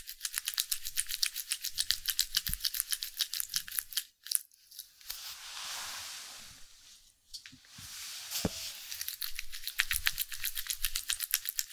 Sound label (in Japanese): 寝くちゅ 休み